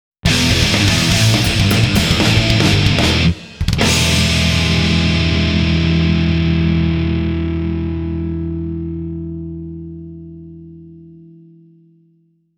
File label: Game Over; Arc System Works; GUILTY GEAR SOUND COMPLETE BOX